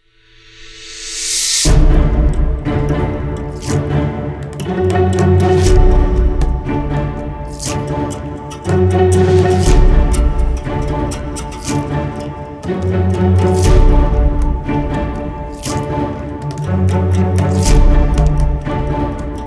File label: Countdown; Sniper Elite 3; AMP